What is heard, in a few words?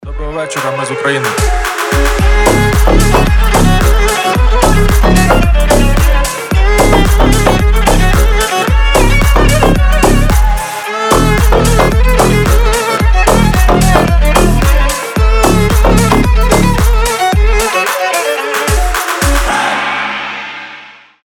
remix